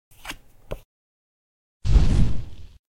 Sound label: Tarot draw; Phasmophobia